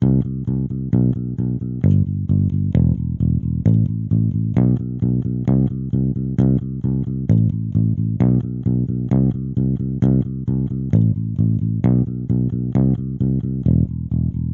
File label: Elec bass